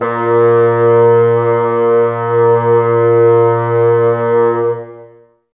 Bassoons A#2; Miroslav Vitous - Woodwind Brass Ensemble

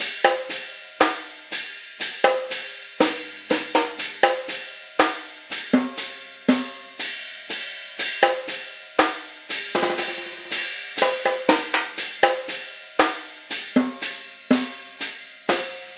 Cymbal and Snare

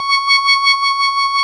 ORG VOX C#, Continental, Vox Wah Bar; Roland LCDP09 Keys of the 60s and 70s 1

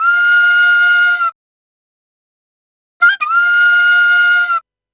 Penny 075bpm Bb, Penny Whistle, African

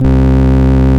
VOX CONTINTL, C2; Big Fish Audio - First Call Vintage Keyboards

